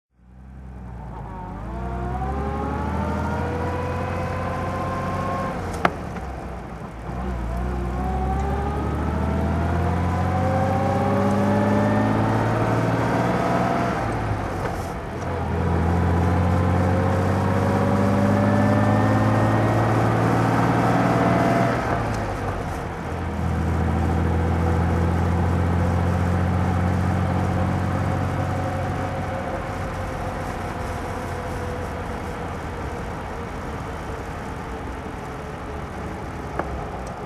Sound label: alvis speed25 dhc driving